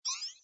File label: Extra Hit